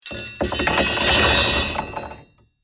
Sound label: Metal Crash Tumbling Debris; Metallic Thuds; Materials; BBFX